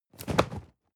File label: cardboard box medium fall; objekte